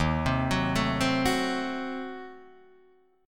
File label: Eb Diminished 7th Eb, Guitar Open D